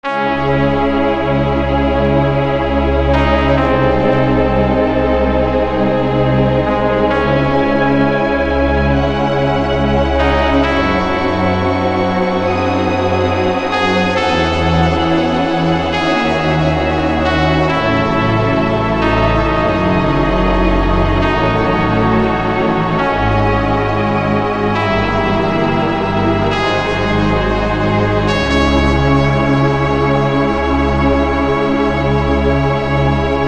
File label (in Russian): Оркестр Симфония